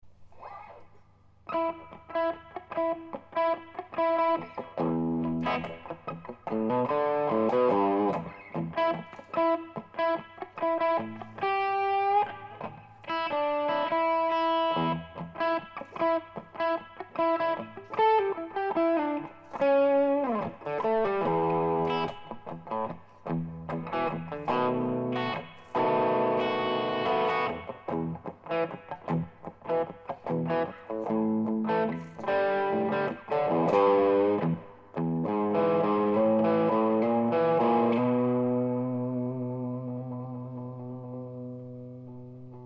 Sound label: groove